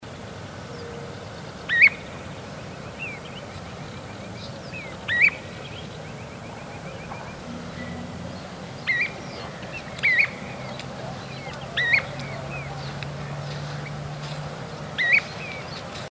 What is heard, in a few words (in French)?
grand gravelot, chant